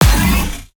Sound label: UI Noisy Impact; Doex Studio; Qantum UI